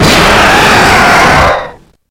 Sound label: jumpscare sound; pnuozhika 5